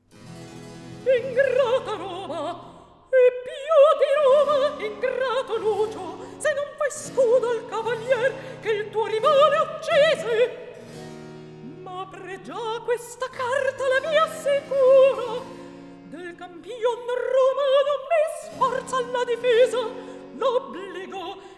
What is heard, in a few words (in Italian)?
Recitativo; Atto 2 - Scena 11; Vivaldi; Tito Manlio (2005); Lucio; Ingrata Roma, e piu di Roma ingrato